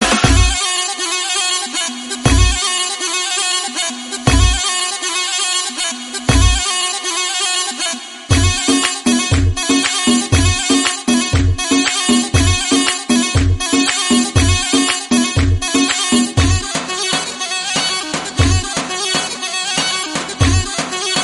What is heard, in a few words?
Tiktok Dabke Remix Ringtone